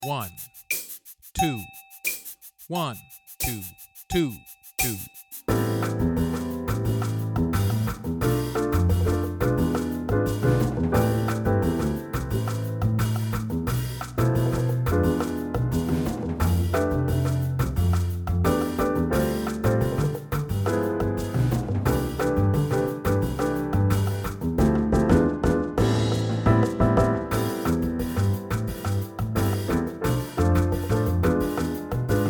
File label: Vibes Play a Long LATIN; Jazz-Band; All-State